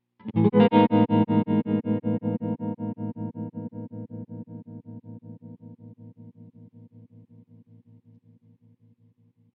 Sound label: Tremolo Sine